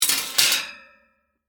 Vent Cover Down, Industry, Vent, Cover, Set, Down, Metal; Machinery Sound Effects; SoundEffects-BlastWave